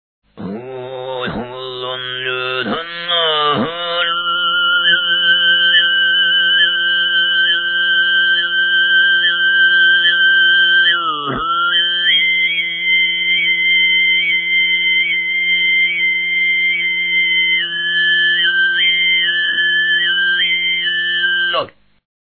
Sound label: Overtone